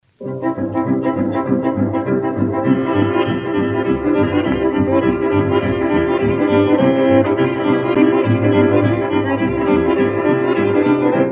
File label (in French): Sirba - Roumanie